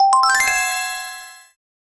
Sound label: a magical shimmering chim; bright